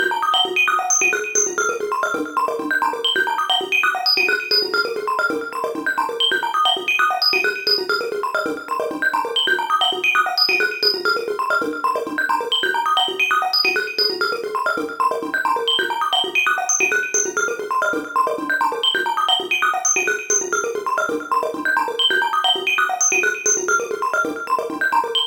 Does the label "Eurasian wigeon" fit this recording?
No